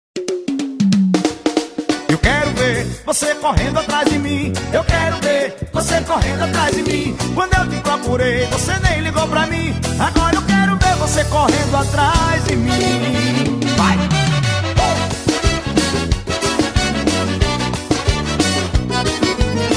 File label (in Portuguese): forro